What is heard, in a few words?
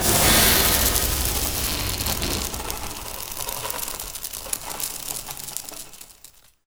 cryo freeze; Quake 4 Alpha